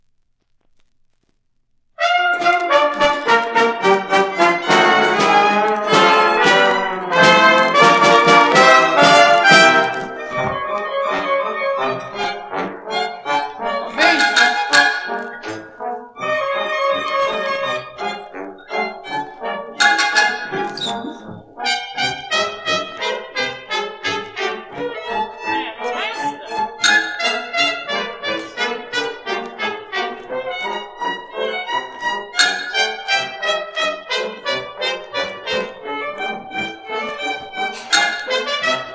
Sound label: Transformation Music